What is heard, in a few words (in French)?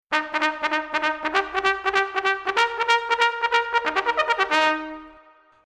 Sonnerie Chargez